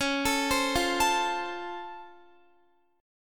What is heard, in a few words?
Db Augmented Major 7th, down, Guitar